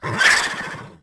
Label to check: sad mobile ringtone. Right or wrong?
Wrong